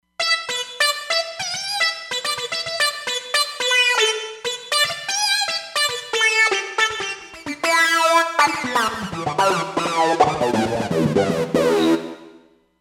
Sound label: Lead; Razid Lead